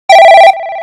Ringer; E-TEN M600

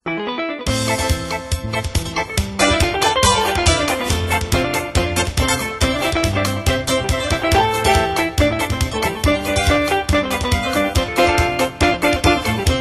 Boogie, phone tones

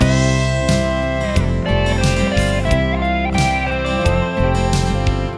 Country, ringtones; Google; NewWave Labs

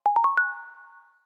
circle unlock, phonetones, ui; LG Optimus G F180K

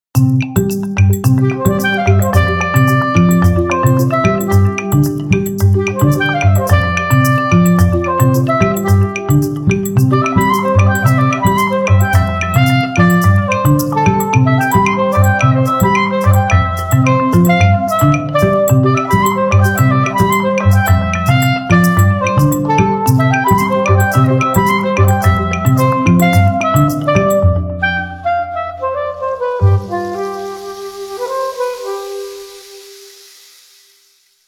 Concertino

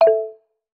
Dock

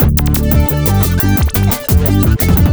ringtone, electro; Google; NewWave Labs

